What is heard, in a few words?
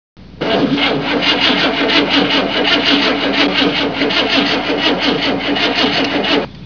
no start